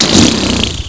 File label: pain; quake2; railz